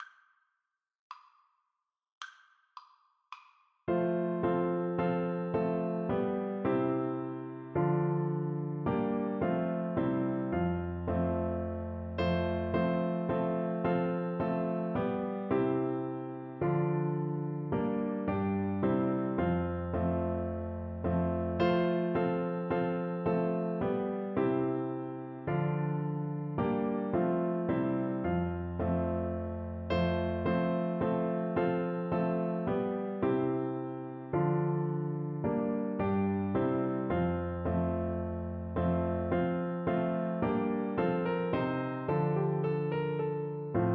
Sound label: horn, French Horn